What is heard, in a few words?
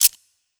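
Human Hunter Mecha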